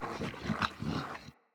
Corrupted Battle Dog Idle Breath Pant, Animals, Vocals